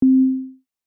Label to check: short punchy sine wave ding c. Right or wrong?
Right